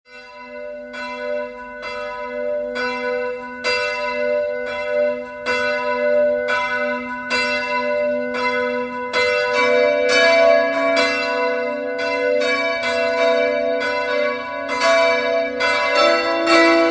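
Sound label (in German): Tonaufnahme h1 cis2 e2, mehrstimmige Geläute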